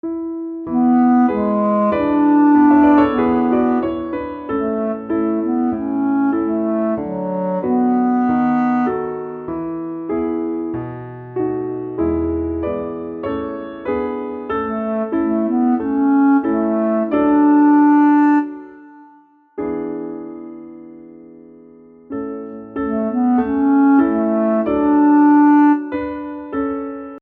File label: slower tempo piano and baritone; Mozart; La ci darem la mano